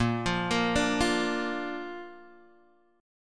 A sharp Major, Bb; chord

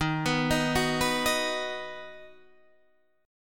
Eb Minor Sharp 5th, down, Guitar, Standard; Ebm#5 arpeggiated; JGuitar

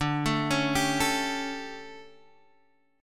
D-Major 7th Suspended 4th, D; arpeggiated; x,5,7,6,8,5; chord

